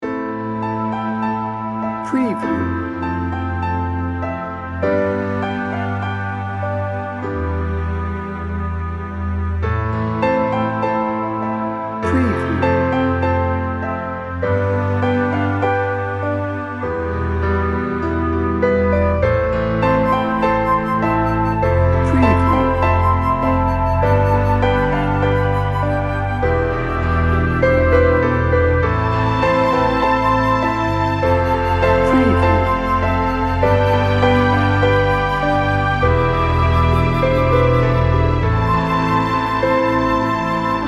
warm reflective piano orchestral

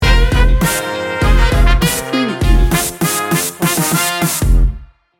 new orleans street party sting bumper

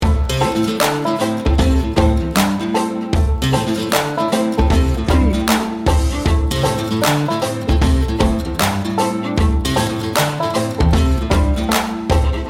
redneck hip hop loop

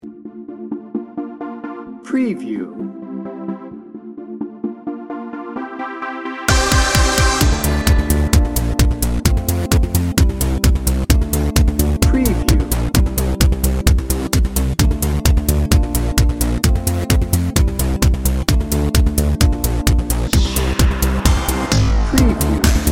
party party edm bed

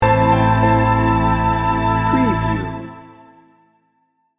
warm reflective piano orchestral sting bumper

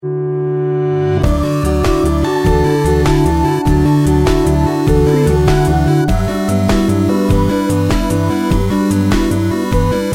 sweet and mellow

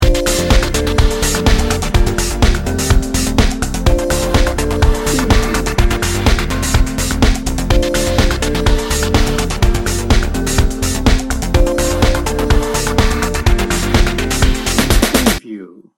trance dance loop

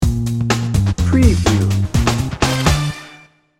big city hip-hop sting bumper

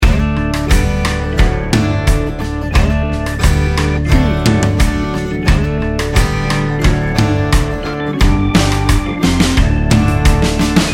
swamp jam loop

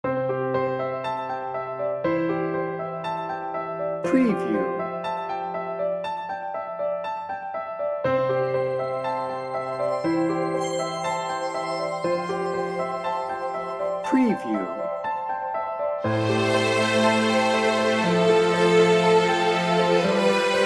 inspired dreamer no percussion

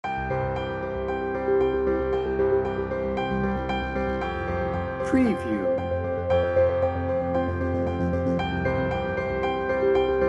flying high no drums

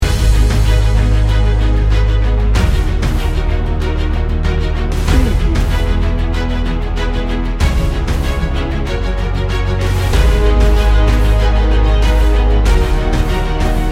cinematic power

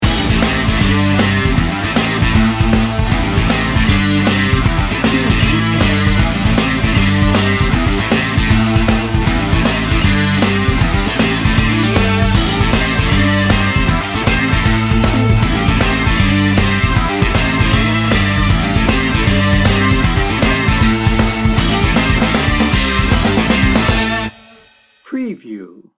slow fist no solo

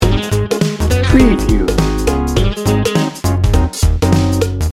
funk in da house loop

